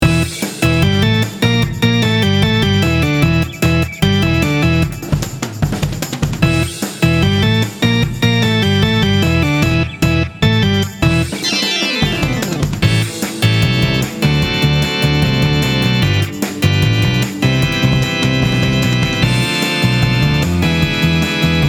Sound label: VOX rock